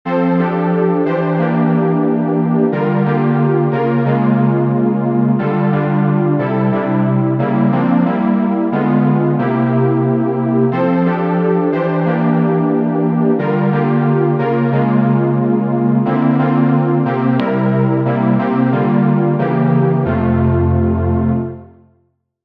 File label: Lullaby instrumental